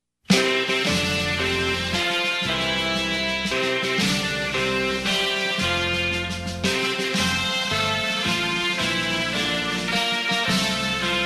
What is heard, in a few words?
instrumental happy birthday